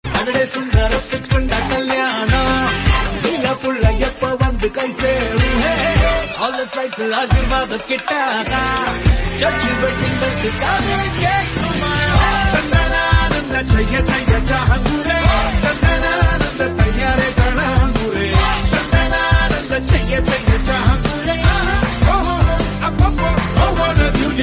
Tamil Ringtone

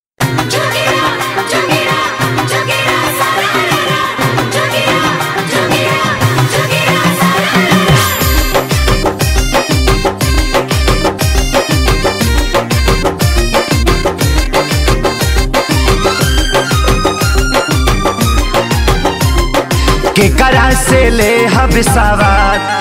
Bhojpuri Holi Song Ringtone

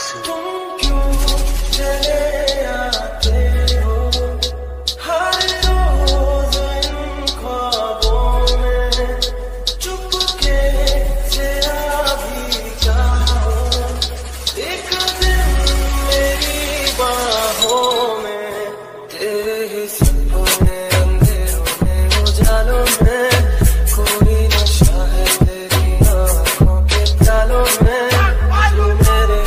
Hindi Romantic Song Status Ringtone

